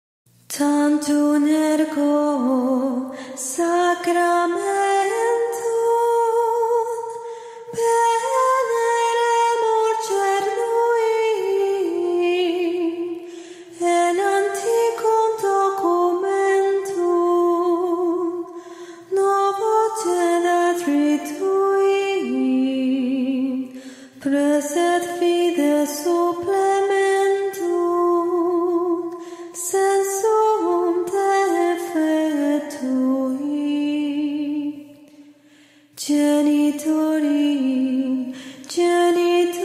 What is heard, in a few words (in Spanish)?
Tantum ergo CON LETRA Himno Eucaristico Musica Catolica